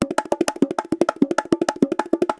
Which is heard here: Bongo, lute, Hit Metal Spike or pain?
Bongo